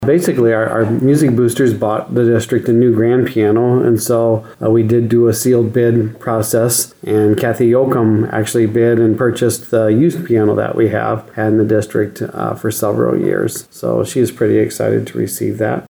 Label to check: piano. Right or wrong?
Right